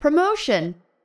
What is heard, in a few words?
promotion